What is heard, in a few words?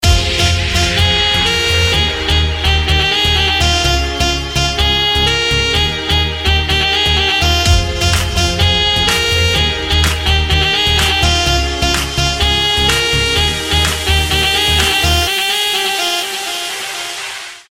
Feel The Sax